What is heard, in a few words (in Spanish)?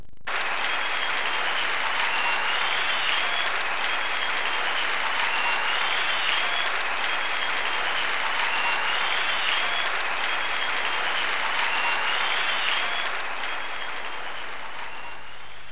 APLAUSO; El Juego De La Oca Loca